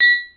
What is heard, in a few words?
plink